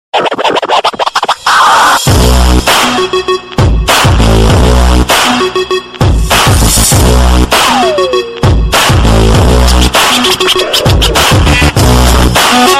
drums funky deep club remix tone ringtone

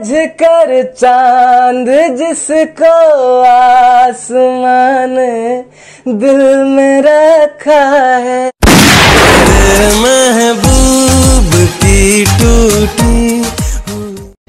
funny ringtone

